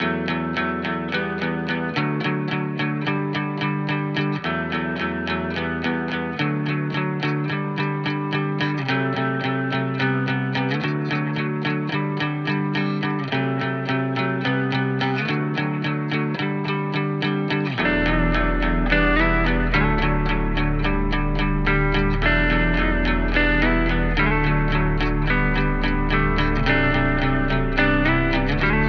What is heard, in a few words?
108 BPM E Min, Guitar; Clash; Cymatics; Miami Guitar Pack - Pt 1